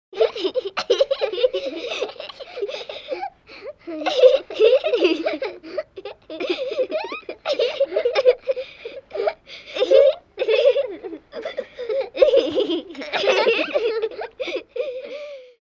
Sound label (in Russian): Deti devochki smejutsya